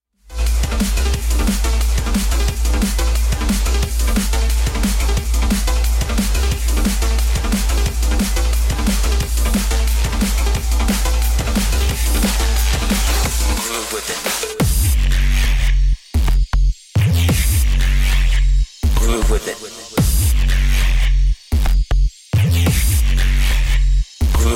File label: groove with it; break